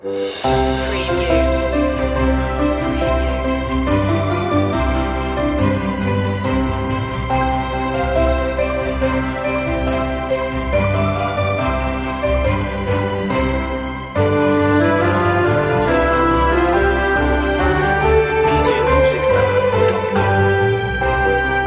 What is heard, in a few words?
orchestral loop; deck the halls; media music now